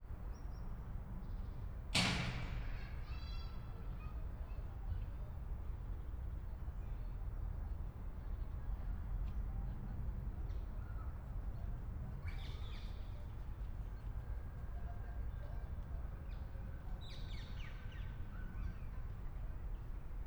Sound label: Suburban Residential Area Children Playground Less Traffic; General Ambience; Systematic-Sound; General Ambience Series – Suburban 01